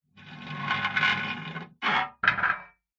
foley castle dungeon door metal large heavy open

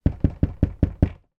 knocking on plastic storage box; foley

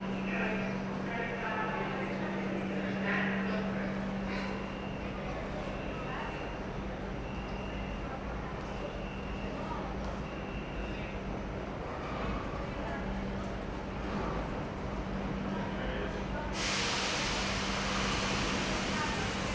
ambience train station subway nyc trains people; sound effects one

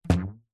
rubber band ping low pitched; foley

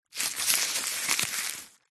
foley paper ticket screwed up handling; zapsplat

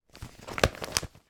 foley magazine movement; zapsplat

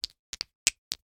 foley batteries aa x2 movement in hand; sound effects one

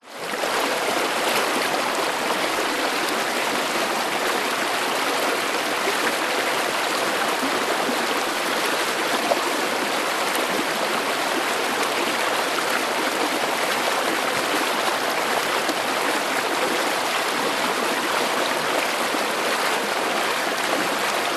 nature river fast flowing shallow close up; zapsplat

